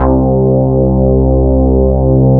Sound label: BAS, Eurotech; Sounds Good